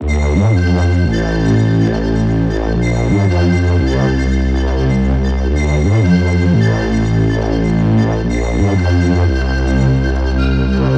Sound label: DNB Song Starter D 175; KULTURE